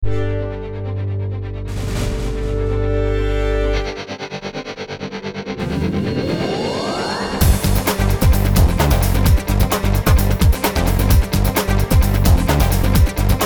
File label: Rythmic Pad; Musique moderne, chansons pour générique d'émission TV, publicité, musique source